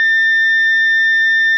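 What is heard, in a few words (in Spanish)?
continuo, sirena electronica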